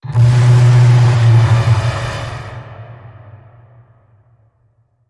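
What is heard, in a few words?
Blast; Insinuation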